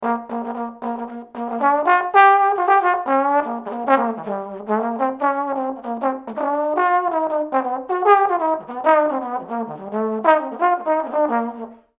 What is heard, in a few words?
Trombone